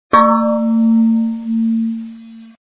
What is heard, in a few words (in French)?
cloche